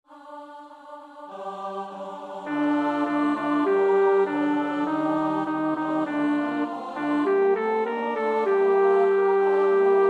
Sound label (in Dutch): bas, koorpartij oefening; Schütz (Heinrich); Matthäus Passion SWV 479